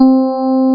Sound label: Soft EP G3; SoundFont - The Developer's Choice - Volume 1